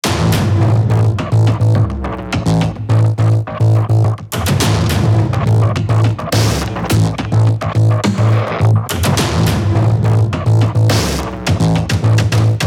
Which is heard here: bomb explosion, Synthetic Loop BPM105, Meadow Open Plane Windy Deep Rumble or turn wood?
Synthetic Loop BPM105